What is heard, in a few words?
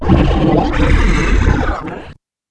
sentien idle; zaero; monsters